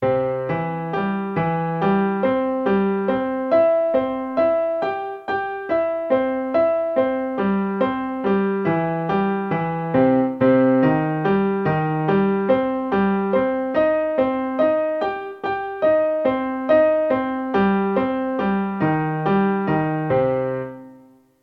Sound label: C and Cm broken chord inversions